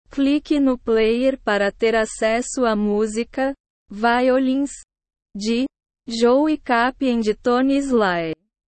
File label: violins